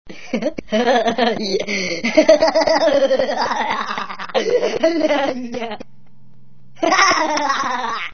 laugh

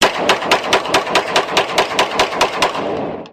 9mm glock 17

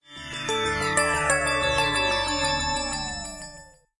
samsung galaxy s 7

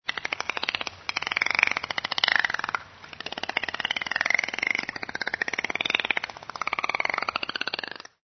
ANIMAL DOLPHIN

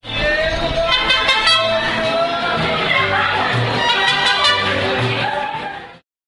ringtones mexican mariachi

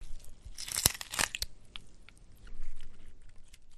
flesh and bone tearing

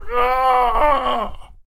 zombie in pain

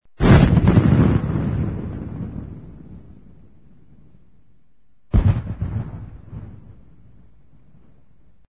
Tanque TANK

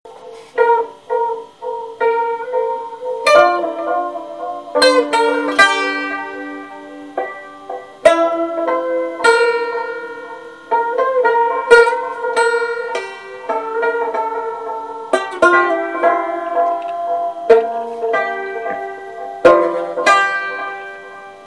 bandolin

